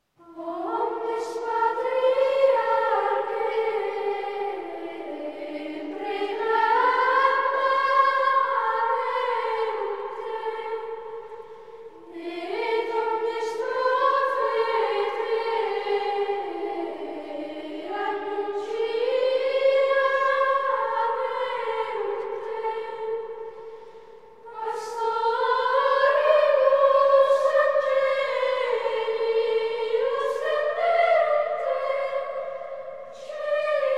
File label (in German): Ambrosianischer Choral - Antiphona; Omnes patriarchae